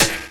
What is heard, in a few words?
DR. LOOP; X-Static Goldmine 3